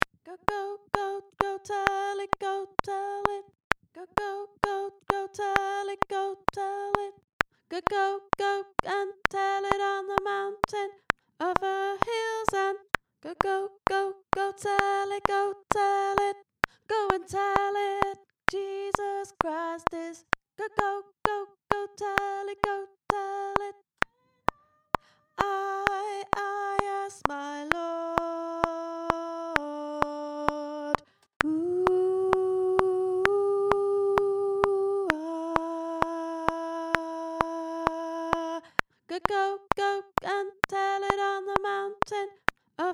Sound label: Go Tell It soprano